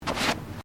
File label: Footsteps Bare feet Carpet Step; Footstep Foley; Footsteps (Sound Effects:Human)